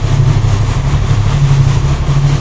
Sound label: wind suck loop; hl2; combine gunship